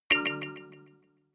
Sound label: notification kalimba delay major